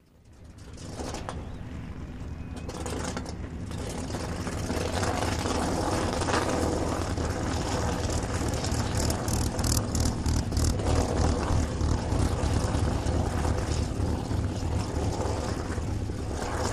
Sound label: Airfield Ambience Multi Prop Planes, Starts And Idles; ww2 fighters